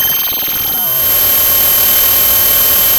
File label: pop, Sound of a cat meowing